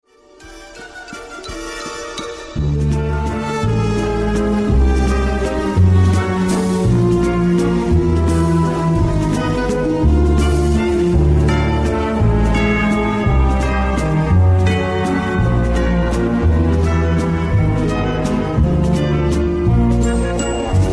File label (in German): glockenwalzer